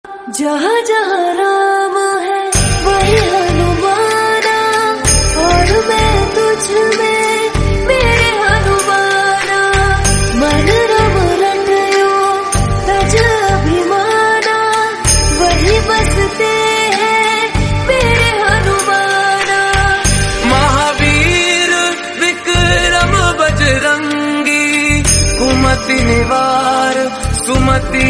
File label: bhakti, devotional, Hindi